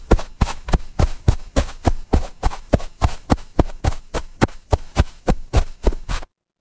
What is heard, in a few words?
footsteps snow